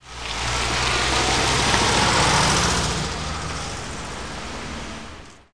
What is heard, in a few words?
driving, vw